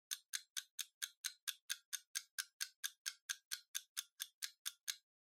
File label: Kitchen Timer (Tick-Mid)